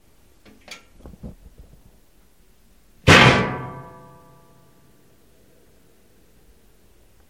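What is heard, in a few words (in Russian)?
звук падения металла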